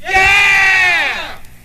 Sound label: Yeeeeaahh Vox